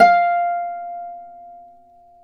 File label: Celtic Harp, Plucked Strings